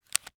Technology Electronic Phone Cell Phone Opening Slow